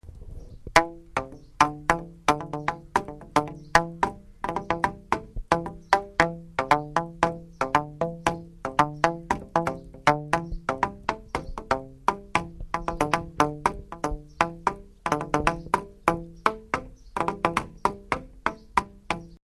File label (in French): clapo